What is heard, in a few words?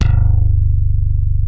DYNA HARD G1, DIGITAL BASS; Music Store - Claudius Diemeter Schwerlos